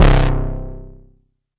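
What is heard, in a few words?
mbrute synth, Arturia MiniBrute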